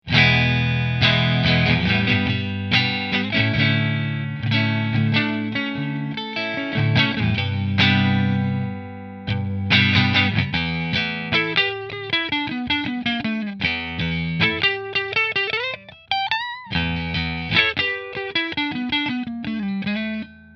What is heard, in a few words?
Grosh Strat Position 3 Fender